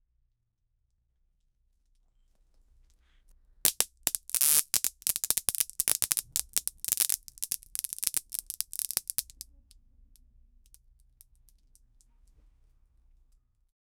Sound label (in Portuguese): caos pessoas caindo monta, chaos